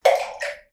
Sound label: water splash flooded bunker; sound effects one